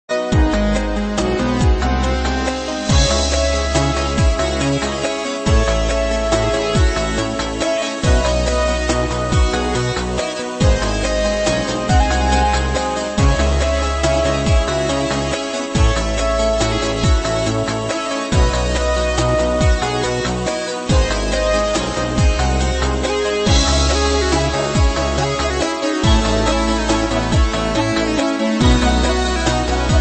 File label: Arpeggio